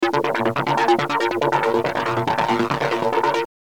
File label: SMS Ringtones